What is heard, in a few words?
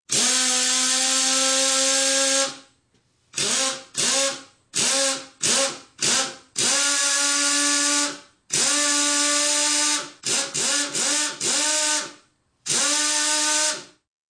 Beeps; 헐리웃특수효과음; 신호-경적